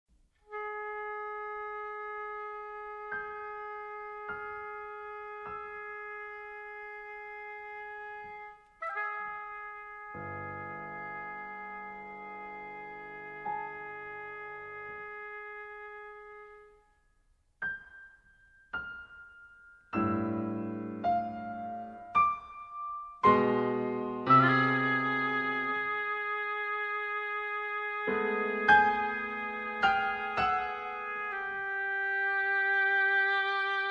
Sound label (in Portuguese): para oboé e piano; Sabra para oboé e piano (1983); Harry Crowl